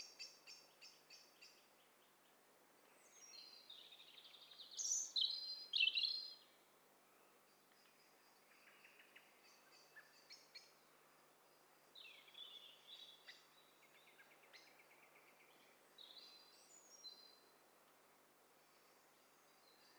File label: STEREO BIRD COCKTAIL PARTY